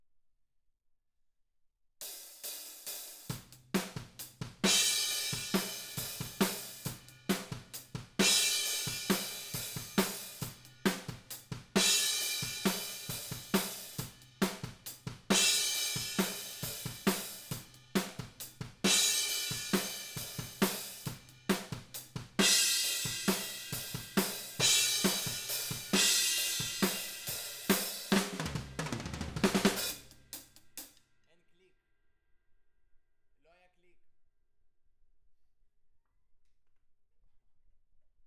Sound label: OHS